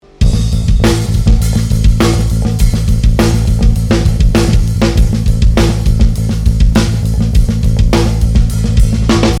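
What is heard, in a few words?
full add, batterie, basse; je m'excuse pour le bassiste qui ne joue pas bien en place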